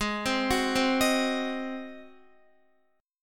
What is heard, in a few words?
Ab 7th Ab Guitar 4F,4A,5C,5G,5C,6E; Ab7 arpeggiated; JGuitar